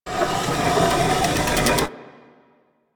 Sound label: Piston Raise; Warframe; Ambience; Eidolon Plains